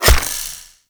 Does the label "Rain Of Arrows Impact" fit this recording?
Yes